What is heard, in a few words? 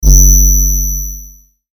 Hit High Frequency, Hit High Frequency Ring Deep Bass Pound; Blastwave